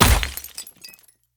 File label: axe throw